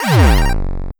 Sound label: battle start